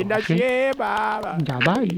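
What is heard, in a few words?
Music Village Calm Inside; from dust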